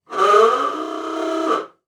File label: Transportation Car Ford Model A Honk Horn From Rear